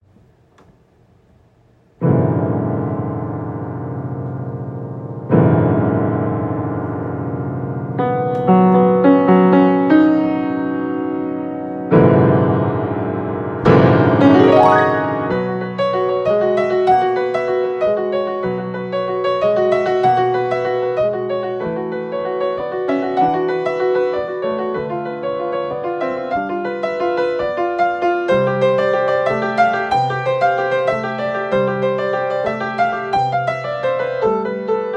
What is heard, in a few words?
Thunderstorm and Rainbow